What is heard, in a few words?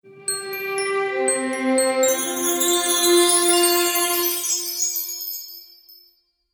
Magical Music Finale, Cartoon Music Sound Effects